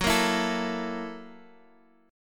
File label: F sharp 7th Sharp 9th, chord; strummed; 26,25,26,26; JGuitar